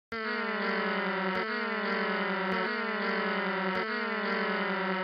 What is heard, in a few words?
brrrrrr